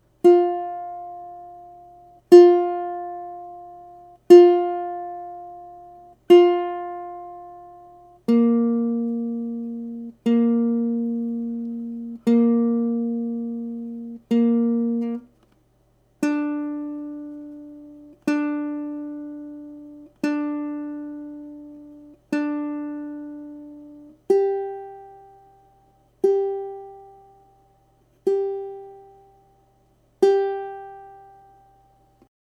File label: Tuning, ukulele